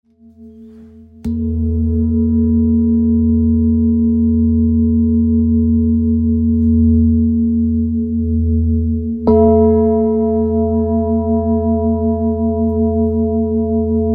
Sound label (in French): Bol ramgandi re